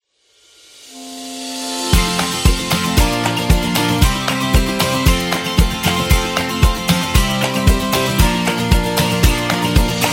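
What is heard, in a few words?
Food Upbeat, Kids background music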